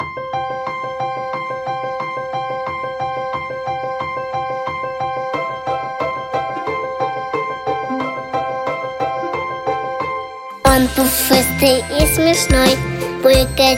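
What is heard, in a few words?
song; pesenka dlya doshkolnikov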